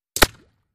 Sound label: Bullet Impacts Water